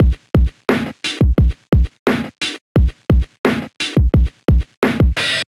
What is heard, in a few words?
MPE Otari Bounce Kit 87 bpm, hip-hop drums; Golden Era; MIDI Clips; Sound Oracle